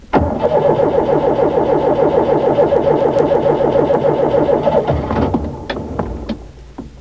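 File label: starting; Sound Library 2000; Wayzata Technology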